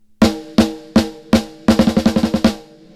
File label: snare; Royal Compressor; MODEL A